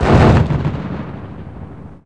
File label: tank; PC Gamer IT CD 32